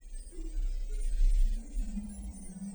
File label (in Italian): possibile cantilena feminile